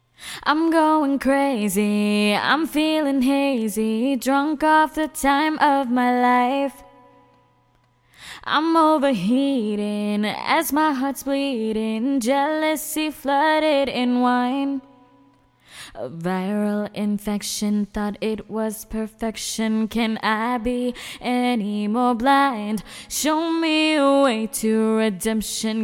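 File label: dance pop 122 G minor, Acapella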